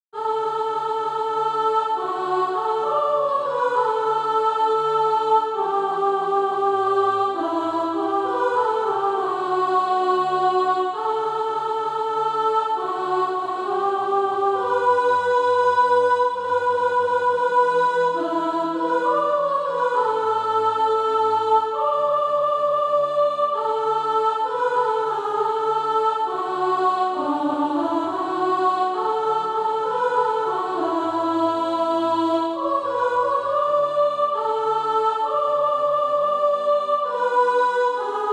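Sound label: soprano, Practise Music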